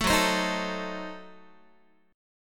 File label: Gb Diminished 7th Guitar